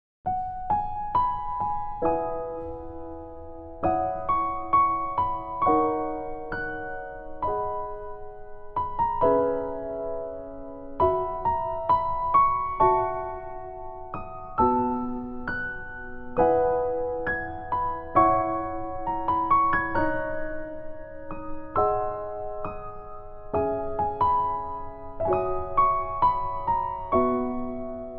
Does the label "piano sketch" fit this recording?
Yes